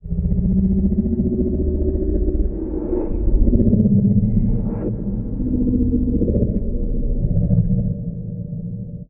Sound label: growl; goatman revived